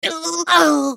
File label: dying; Heimerdinger